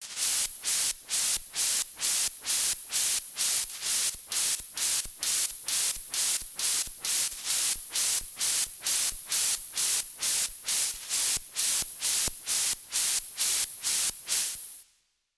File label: Sweeps